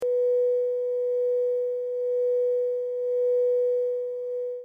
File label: crystal singing bowl tone b; chakra7